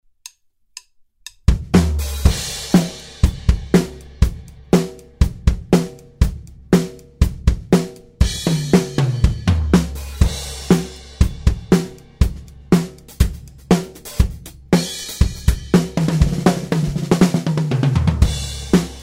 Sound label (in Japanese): 生音 サンプル; rupert neve designs 5211